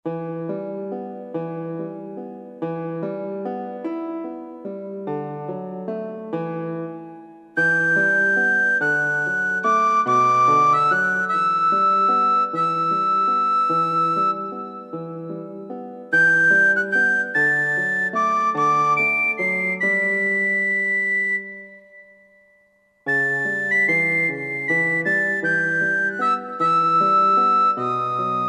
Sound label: Sinking Duo